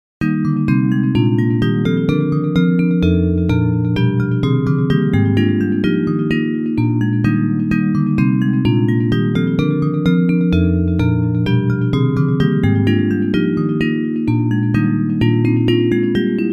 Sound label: Bells